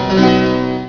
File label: gain level; Exile III v1.0